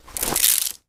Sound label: kamae soft; nahyuta